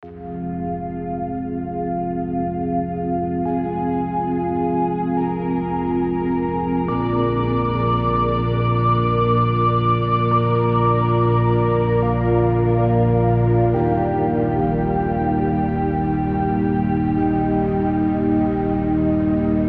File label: Travel Ambient